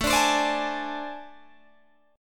A Minor Major 7th Double Flat 5th A, Guitar, Open G